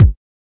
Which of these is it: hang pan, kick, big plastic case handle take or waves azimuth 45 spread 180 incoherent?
kick